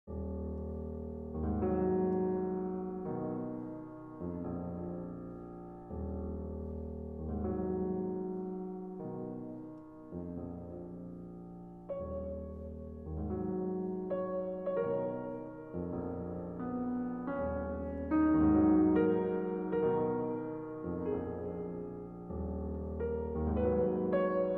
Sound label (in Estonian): Klaveripala Kellad